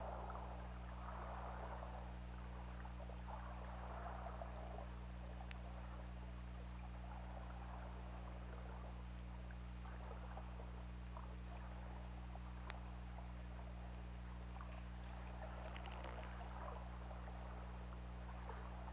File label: Orca; OrcaLab